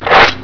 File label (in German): SCHLUES